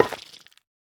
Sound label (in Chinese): 幽匿催发体放置声